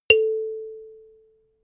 notification kalimba single

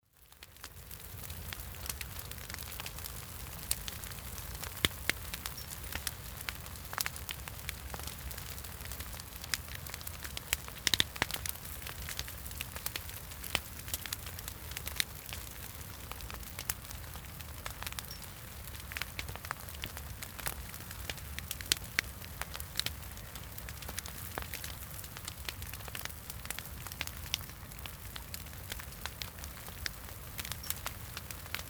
easter bonfires

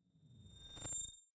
sci-fi beep fade in high

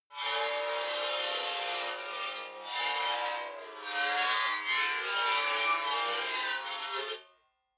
metal scrape rich sequence long

